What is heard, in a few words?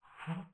strange thwoink; angband